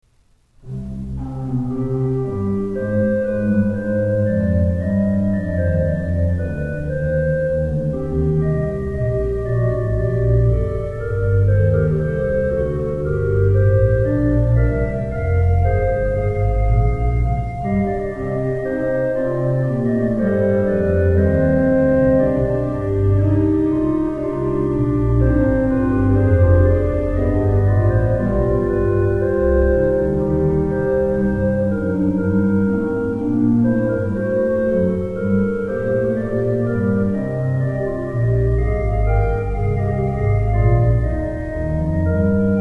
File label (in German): orgel alpirsbach